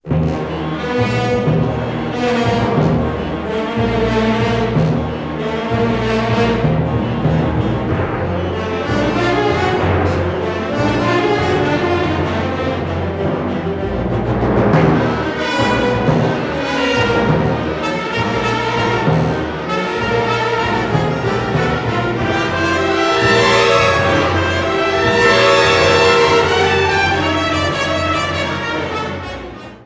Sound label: storm; Guide To The Orchestra